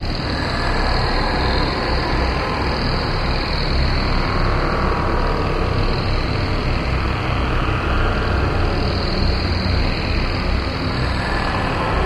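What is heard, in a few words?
Cylinder Movement Grinding, metallic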